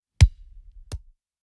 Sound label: football punts